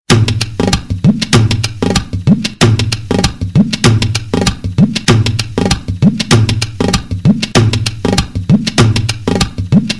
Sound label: African Drums